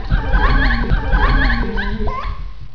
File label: ALIEN; 机器